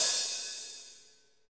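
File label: HH, hi-hat; Sound & Vision - Gigapack I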